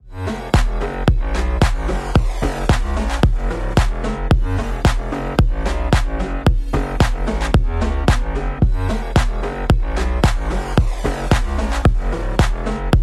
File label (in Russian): muzyka v mashinu bass boosted